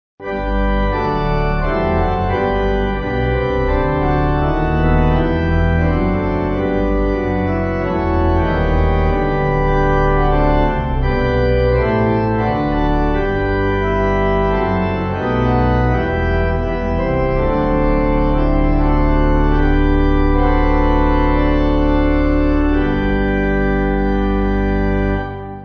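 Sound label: Pipe Organ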